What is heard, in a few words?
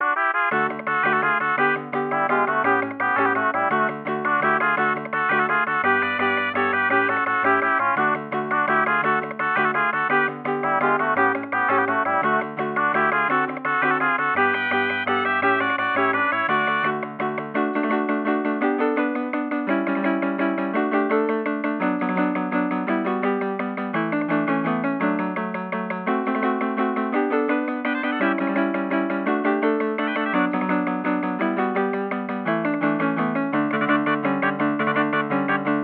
Mariachi, Aircheck, Phono